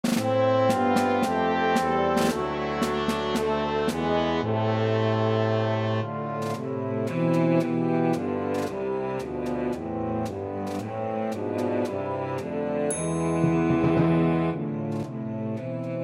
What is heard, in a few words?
Cello Track with Melody; God Rest Ye